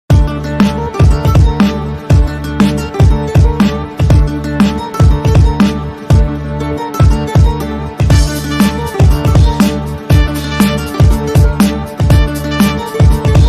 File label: Breakbeats; Solo Break Dance Adult; ADF 2025; Bboy; competition